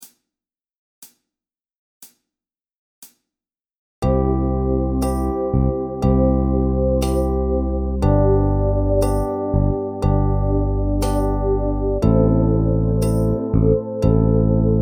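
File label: Drum Groove Slow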